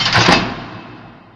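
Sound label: hall elevator door move; hl2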